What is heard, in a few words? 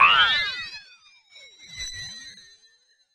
synth efx rise